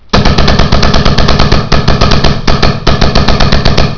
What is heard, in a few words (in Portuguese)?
tiros